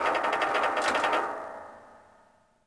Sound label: creak metal; Star Wars Obi Wan; XBOX